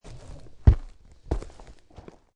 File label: Dinosaur steps. Jurassic creature or large animal, small footsteps on hard dirt; Monsters; Horror